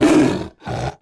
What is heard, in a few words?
roar